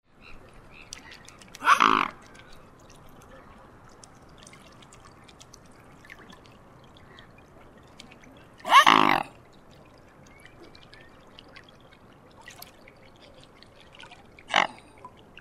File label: mute swan